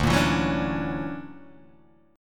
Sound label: D Augmented Major 9th, Guitar, Open D